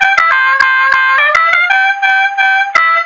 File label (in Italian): fanfara